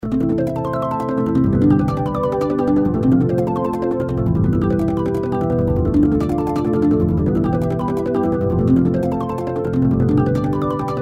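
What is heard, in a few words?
Harp arpeggio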